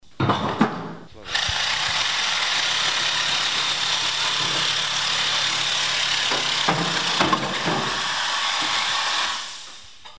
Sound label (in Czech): Zvuk těžba